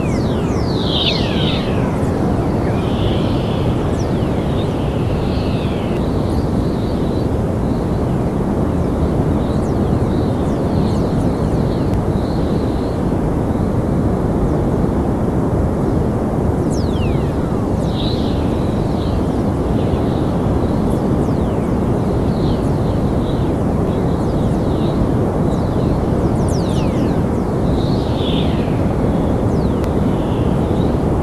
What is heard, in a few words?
WFR audio emfisis